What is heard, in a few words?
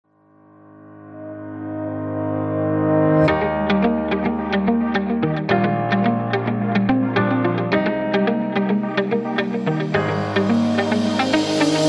music